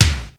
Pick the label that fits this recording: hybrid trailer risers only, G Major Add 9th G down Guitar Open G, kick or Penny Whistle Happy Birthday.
kick